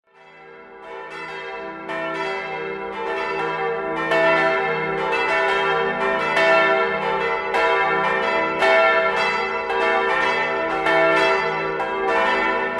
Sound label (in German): Klingelton; St. Josef Strass